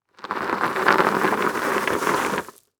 Transportation Tire Roll Over Rock Salt

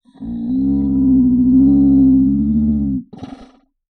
Animal Mammal Carnivore Cat Snow Leopard Moan; animals; AdobeCC

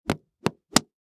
Staff Hit Body Multiple, weapons; AdobeCC

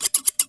hairbrush

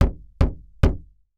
Wood Door Knock Closed Palm, Household

